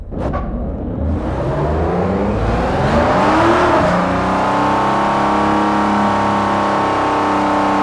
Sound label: rev, vehicles; TDMCarpack; TDMCars